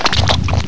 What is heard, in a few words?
SPLAT GIB